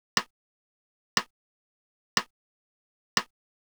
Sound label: Classic 626 Rim Shot; hydrogen